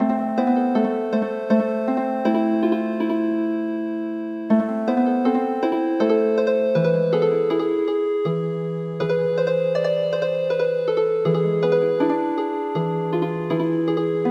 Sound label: Healin Harp